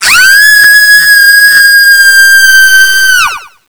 Dash; Type D

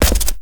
land con, FootStep; WarRock

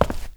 walk con, FootStep; WarRock

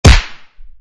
snowball

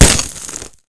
gun glass impact

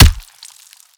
gun body impact

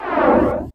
fadeout

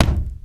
land wood, FootStep; WarRock

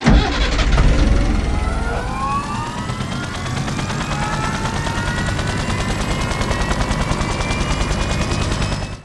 Hind Start; Vehicles; WarRock

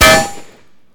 gun metal impact

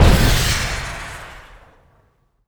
MULTI MISSILE; Rocket

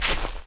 walk grass, FootStep; WarRock

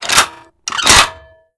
Reload NTW 20; WarRock

